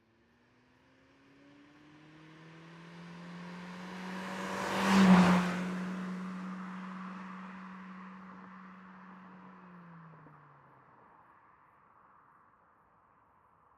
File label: Car By Mondeo 40mph; noise creations